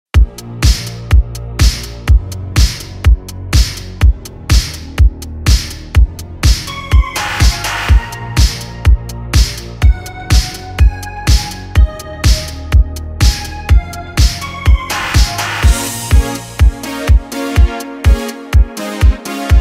80s style pop retro

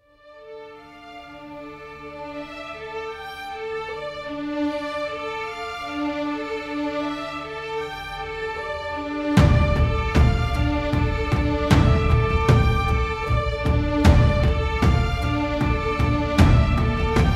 symphonic suite epic orchestral; Praise of God; Solo Leveling OST

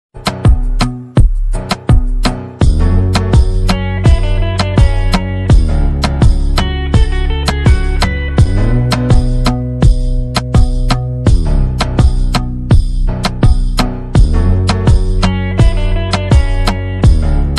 instrumental slowed

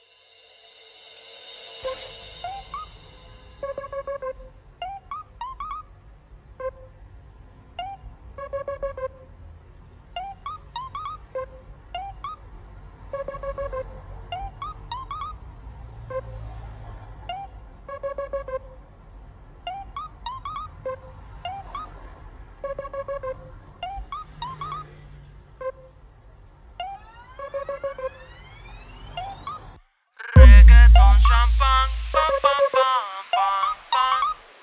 reggaeton

